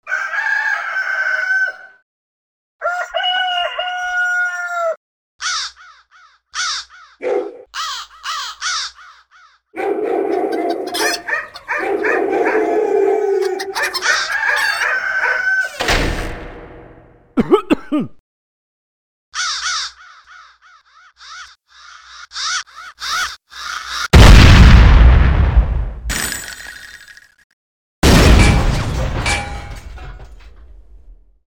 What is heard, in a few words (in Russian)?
petuh poet